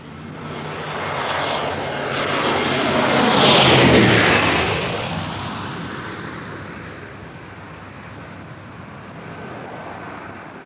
airplane flyby; best service Blue Box

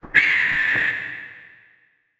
Giant Rat Hit

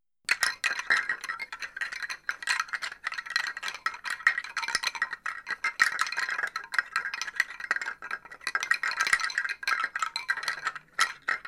Skeleton Rattling; 1001 Sound Effects; Halloween Horror Sounds Effects

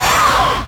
Menu Powerdown; Menu FX

